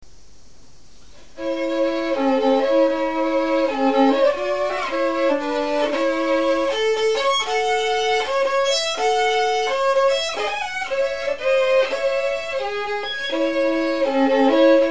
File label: waltz